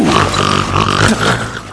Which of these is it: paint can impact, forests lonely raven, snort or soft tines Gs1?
snort